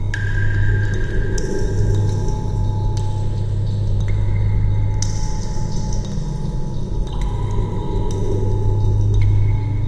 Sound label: jungle underground; Source Engine; tf; lair